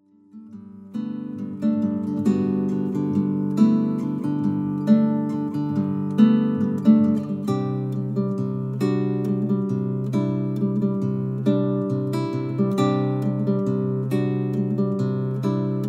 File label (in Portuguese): dancando nas cordas